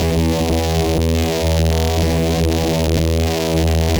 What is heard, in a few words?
Guitar Loop, 120BPM; musicradar; bitcrushed; Crushed Loops